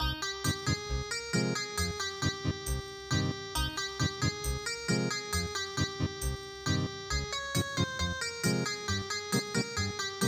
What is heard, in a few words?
Pakistani Lullaby Ethnic Guitar